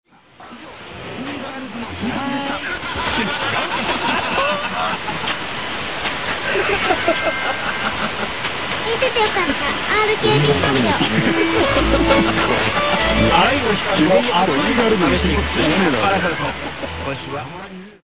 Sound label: 1197kHz Radio; RKB Radio